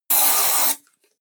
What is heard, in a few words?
spray deodorant; haus-garten